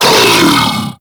Infested Diseased Ancient Pain

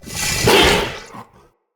Corrupted Battle Dog Attack Bite; Animals; Vocals

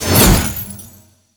Boss Sword Attack Whoosh, Weapons Melee; Warframe; Orokin Weave Sword

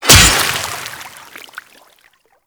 Axe Impact Water; Warframe; Weapons; Impacts

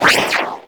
ring bounce; Universe Ring; Warframe; Nezha

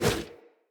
Bow Melee Whoosh; Weapons; Grand Father Bow

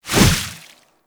Hover Whizzby Sorrow; Pax Duviricus; Warframes

